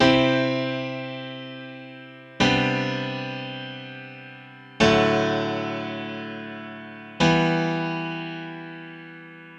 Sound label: Grand Piano - 100 BPM (G Major); Cut Me Down; Ghosthack; Free Guitar And Piano Samples